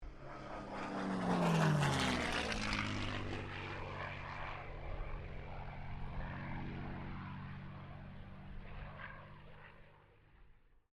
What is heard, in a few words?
AIRCRAFT CA-18 MUSTANG PASS WIND STEREO; P51 /CAC-18 Mustang; Airplanes (Sound Effects:Vehicles)